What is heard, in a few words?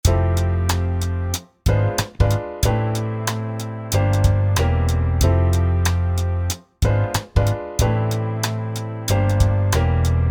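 diminish approach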